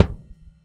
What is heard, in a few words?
Swinging, Dimensional RL Kicks; Airwindows